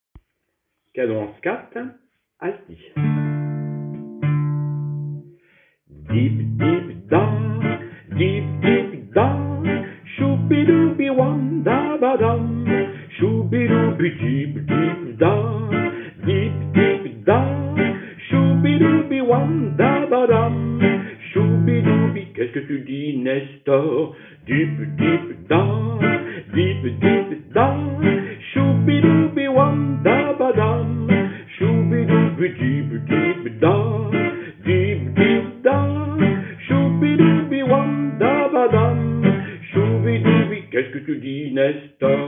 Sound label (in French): canon en scat altis